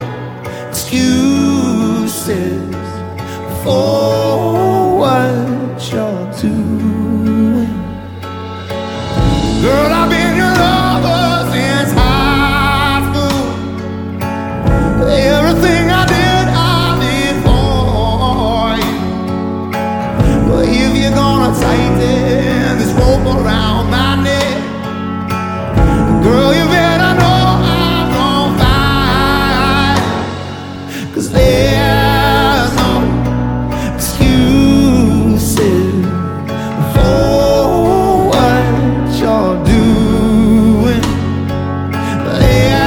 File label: Acoustic Live